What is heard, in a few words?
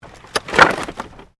Wood Movement Medium